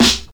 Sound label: old school loud rap steel snare drum sound a sharp key